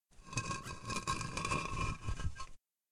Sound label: Medium Rumble Walls; SyrinScape; Hunting horror battle